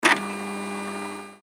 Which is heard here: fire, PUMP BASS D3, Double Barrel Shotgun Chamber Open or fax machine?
fax machine